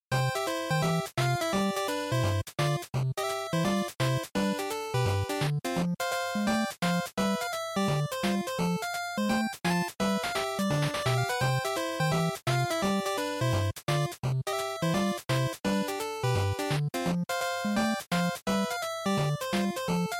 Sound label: Action Stage, bgm